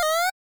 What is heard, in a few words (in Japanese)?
ジャンプ; gameboy